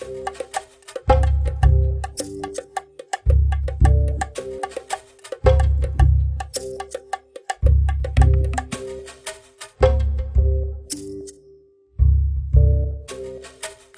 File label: Jungle Level